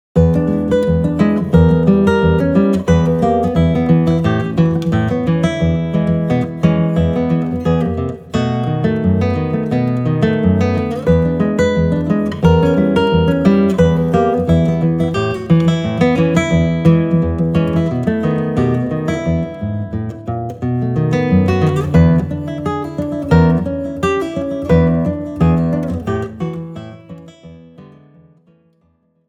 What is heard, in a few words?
Deep River Blues; Saitenwege nach Nordamerika Band 1